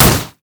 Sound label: basic attacks; Ziggs; poolparty